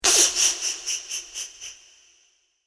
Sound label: Sneaky Villian Laughter With Reverb, evil laugh